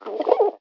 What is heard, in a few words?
Pigeons; rabbids multiverse; iOS, macOS, Mobile